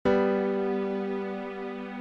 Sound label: G and B chord